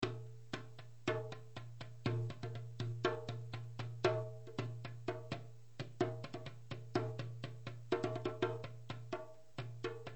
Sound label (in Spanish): Así suena una Darbuka